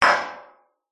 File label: impact metal fence hit strike hard object resonate